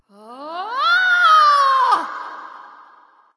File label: battlecry light; smite; voicepack; hel